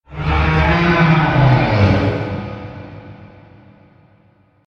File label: Low Roar; Godzilla